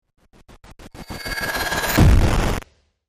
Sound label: Crushed Rise Hit